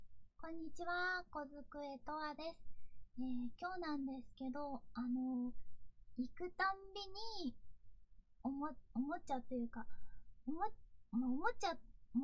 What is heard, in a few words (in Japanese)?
快楽堕ちオナニー